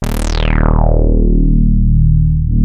Prophet 5 D#2; Hollywood Edge - Giorgio Moroder Rare Synthesizer Collection

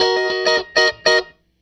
Strat Guitar Loop

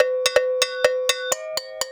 Percussion Loops, Brazilian; Houseworx

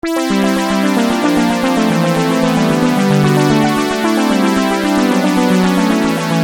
Arpeggio 150 G, Techno Synth Loops; 150bpm; Sonic Foundry (Sony Creative Software); Signals I

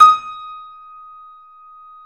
D#, 3-D Audio Collection, 3D Pianos, Yamaha C7; E-MU Producer Series Vol. 5

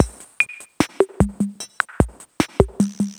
DRUMS Drumloop 150; On Hold

